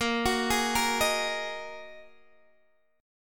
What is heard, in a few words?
Bb 7th Suspended 4th Sharp 5th Bb Guitar Open D; x,13,16,14,13,13